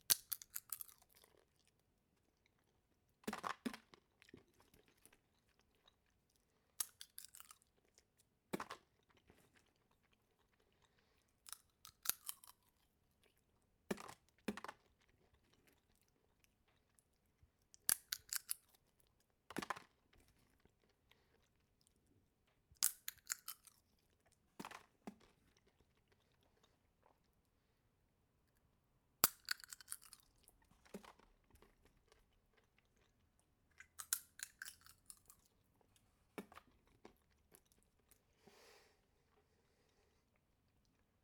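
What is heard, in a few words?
sunflower seeds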